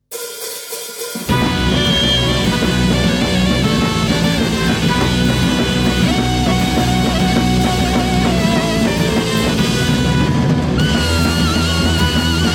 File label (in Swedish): käften sax